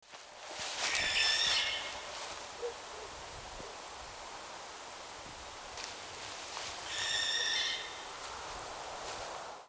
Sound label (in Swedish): Tornuggla